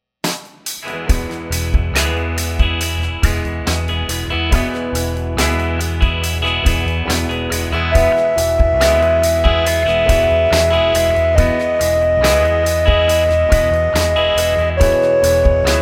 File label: scale degree